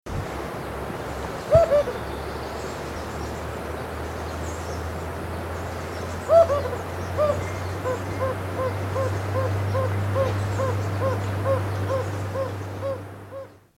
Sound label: jocotoco antpitta